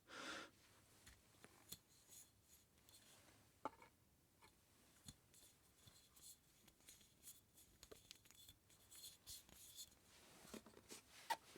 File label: screwing in light bulb